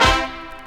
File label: HORNHIT; E-Lab; X Static Goldmine 2; akai